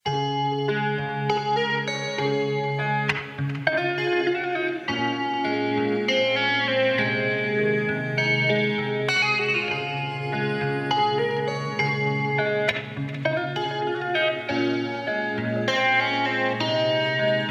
Guitar Theme; Animal Shelter

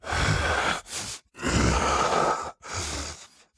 Walk Cycle Burns; The Town

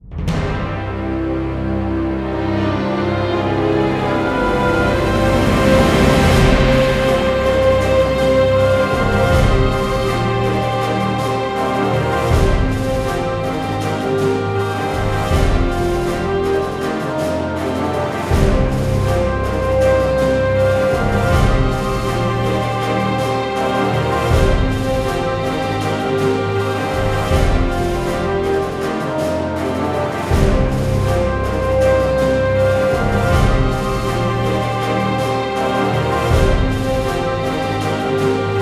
Battle Lose; Soulcalibur VI

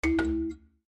Hardware Remove; Savanna

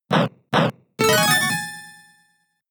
In-Battle Held Item Activate

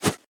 card flip

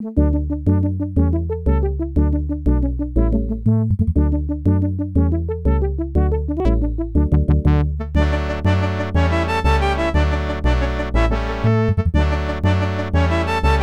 Horn Concerto No 4; Asterix and the Power of the Gods; genesis

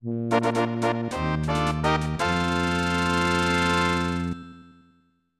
Tournament Fanfare; Shigesato Itoi's Bass Fishing No. 1; SNES

